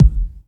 kick single shot f key

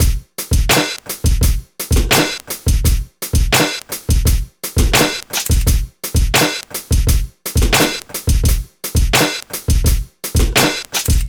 85 bpm drum beat f sharp key

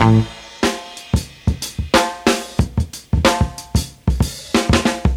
123 bpm drum loop sample a key

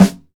clean steel snare drum sample g key; snare drum samples

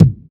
sharp kick drum single shot c key

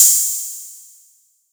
long room reverb ride cymbal sample a sharp key; cymbal drum samples

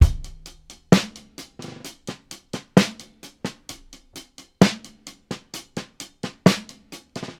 130 bpm drum loop sample C# key

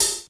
good high hat sound C# key; C sharp

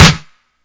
huge acoustic snare D# key; snare drum samples

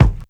bumpin bass drum sample g key; Duration 0:01; Samplerate 44100 Hz; Bitdepth 24

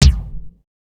big kick c sharp key, kick drum

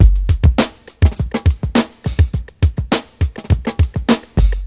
103 bpm breakbeat sample g key; drum loop

